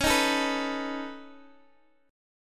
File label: C sharp 7th Suspended 2nd Sharp 5th C sharp Guitar Open G; down; chord shape